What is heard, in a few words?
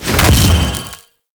Projectile Fire; Enemies; Abilities; Warframe; Sentient; Brachiolyst